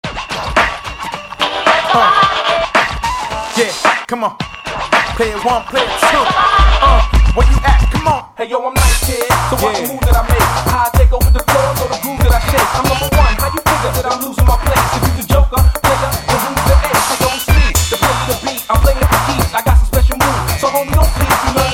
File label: Solo Bass; DDR